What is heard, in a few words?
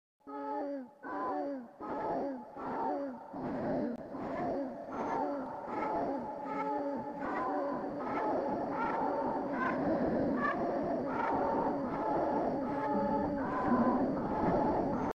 scary cat song